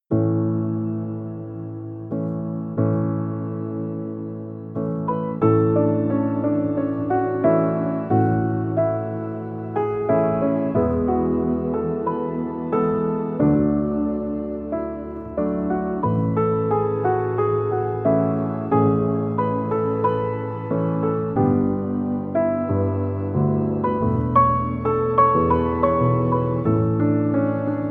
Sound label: Peaceful Piano